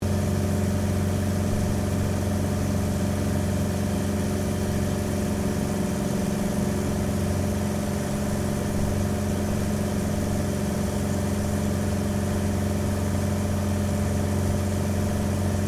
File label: AIRCRAFT CT4A FLYING INTERIOR, military trainer aircraft; Airplane; Vehicles